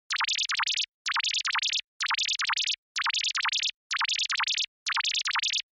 Onoma Panic High

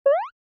SNES Action Jump

